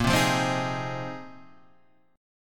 A sharp 7th Suspended 2nd Suspended 4th, Guitar Half Step Down chord